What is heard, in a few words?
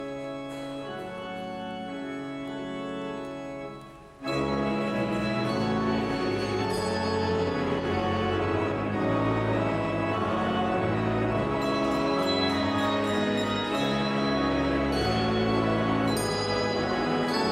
Sanctus, Carillons